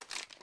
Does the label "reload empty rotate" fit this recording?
Yes